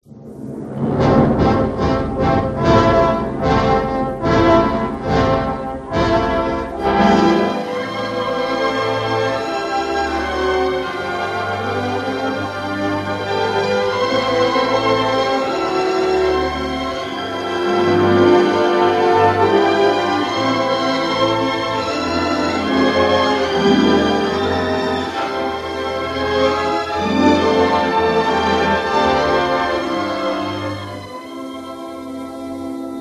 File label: title fanfare opening title instrumental; LONG VERSION